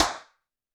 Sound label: Snare, Roland TD-20